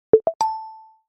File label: message